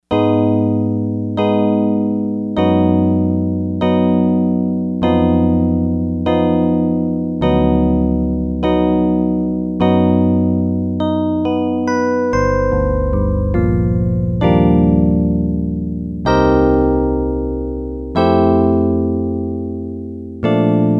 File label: High Tines, Yamaha DX100; Factory Patches